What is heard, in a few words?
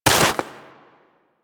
Walk Snow, Footsteps; Warframe; Operator